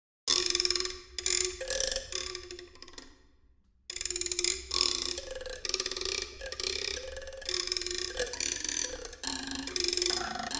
why scrape

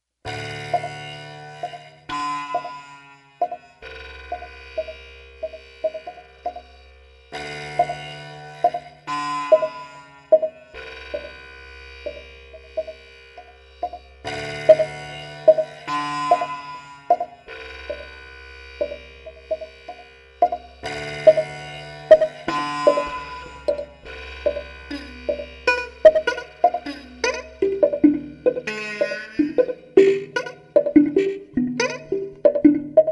rattle tine

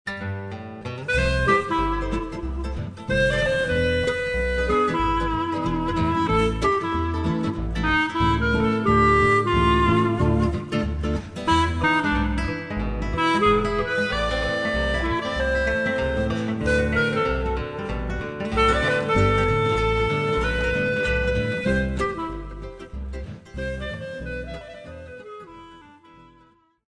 Bossas

